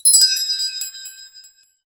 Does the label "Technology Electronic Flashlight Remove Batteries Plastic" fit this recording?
No